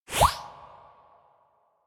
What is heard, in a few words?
Water Attack Small